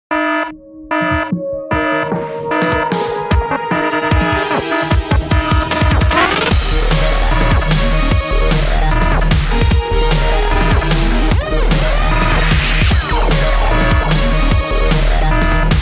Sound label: Alarm Breakbeat